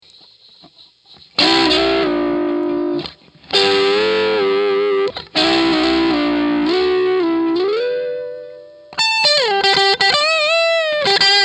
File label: Strat Dirty; Trinity OSD Amp; ODS